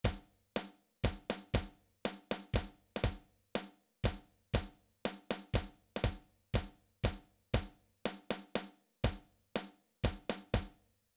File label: drums